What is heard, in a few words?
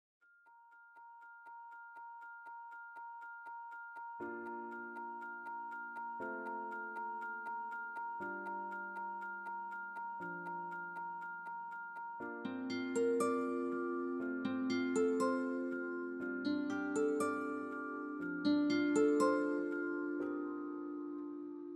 Chord, alarms; Infinix; Hot 50 5G